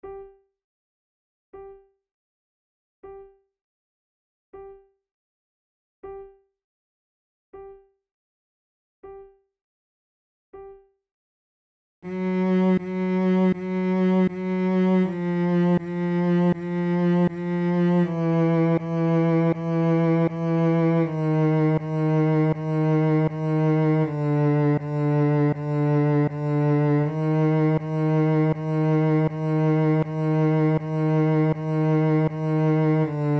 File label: adagio, flute